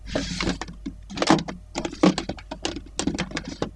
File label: drawer